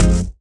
Hit

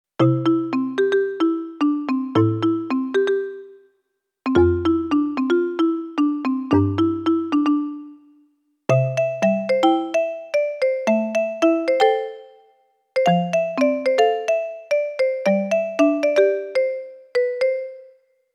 Casio SA 80; 10 Melodic Percussion

